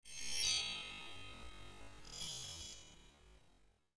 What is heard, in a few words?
metal scrape; zps; Ambient